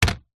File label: technology computer laptop apple mac set down wood